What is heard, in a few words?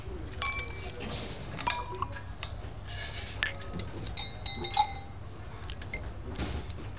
dinner party